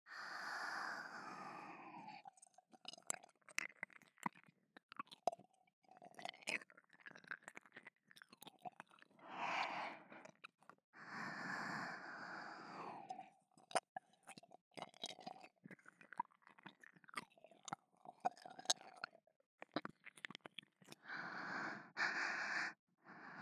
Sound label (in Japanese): 右耳舐め, ASMR